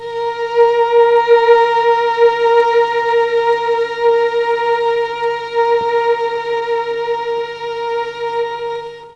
A#4 300 VIOL, Mellotron, STRINGS; Mike Pinder - Mellotron Archive CD

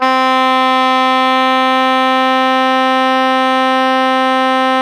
SOPRANO C 3, Saxophones; FF; Greytsounds; Philip Wolfe David Crozier's Saxophones

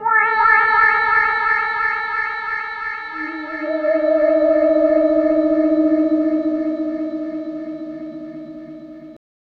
Rhodes FX; EZRollers

